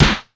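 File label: LIT SD, snare drum; Crypton; DrumBase5000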